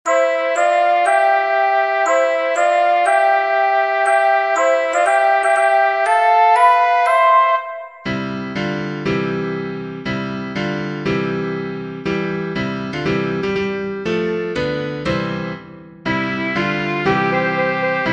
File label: BackingTrack